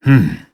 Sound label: hmm; jubei